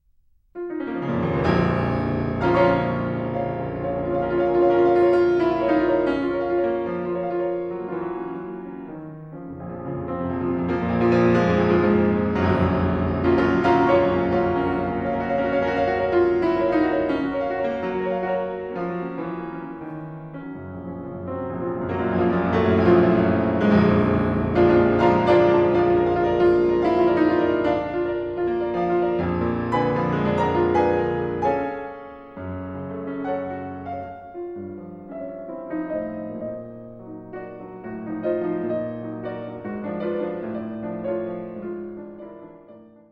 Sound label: Piano Sonata No. 2 in B Flat Minor I. Allegro Agitato